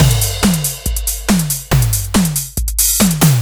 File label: TECHNO BEATS 140